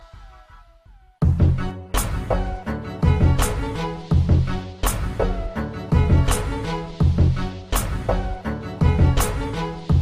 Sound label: Beats